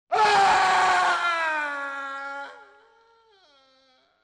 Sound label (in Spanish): grito de hombre asesinado; SONIDOS TERROR